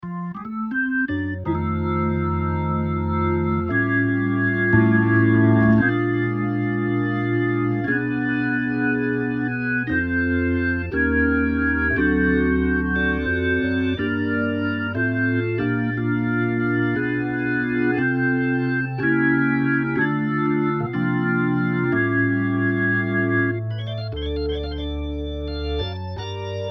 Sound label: The B Soft+Pedal, electric organ; electrickeys